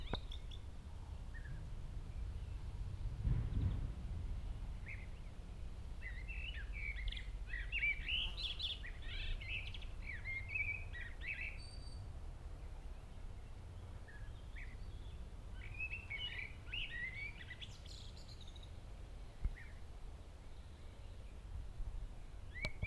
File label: Birds Near The Comacchio S Lake